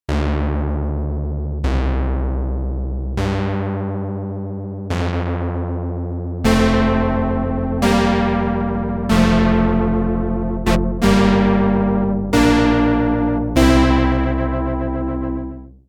Deep Brass

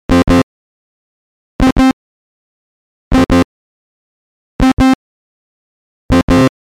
Mini Brute SAW

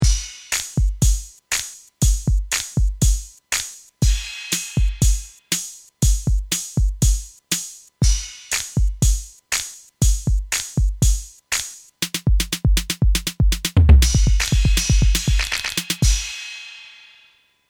Yamaha FGDP 30 Set

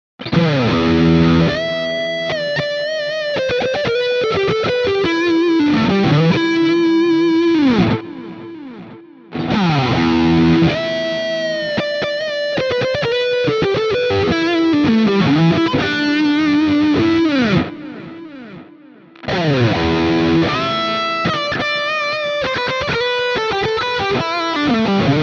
Hagstroem Lead all positions

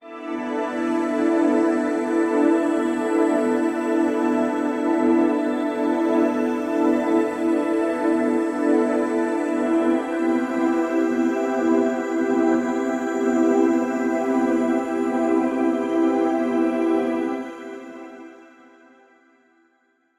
pad

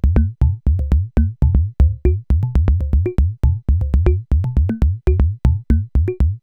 Behringer Syncussion SY-1 Groove; Mutable Grids

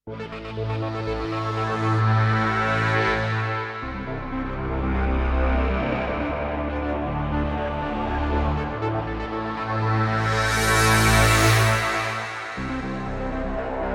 Beetlecrab Tempera; Wavedance Alpha

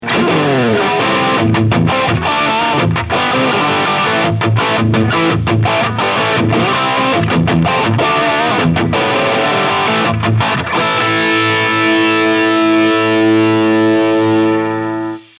Friedman T 7020 Lead

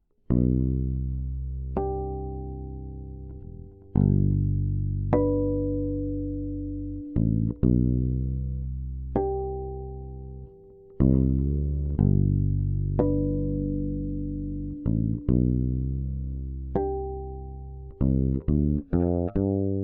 Radial JDI Fretless Bass

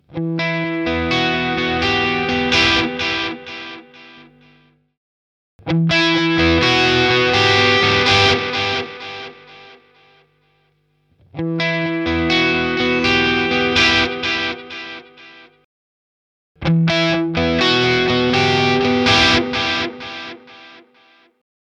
pushed Tape Echo OD; Boss Katana Artist; Centa

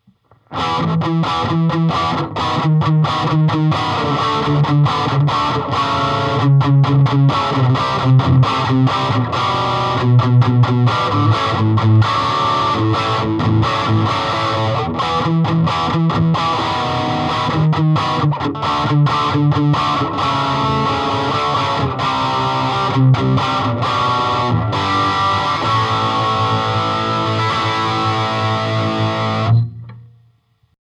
Telecaster Clean Reverb

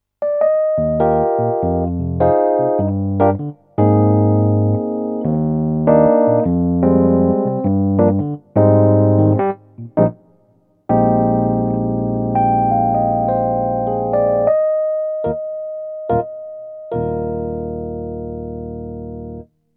Tine EP; Roland RD-08

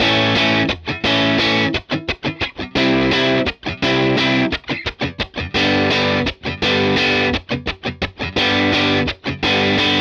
Breakup Middle PU

